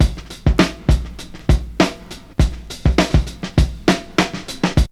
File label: break; Zero G - Man Machine